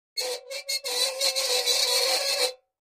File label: Metal Scrape On Metal